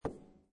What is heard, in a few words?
Billiard Pool Snooker Billiard Ball Hits Side Of Table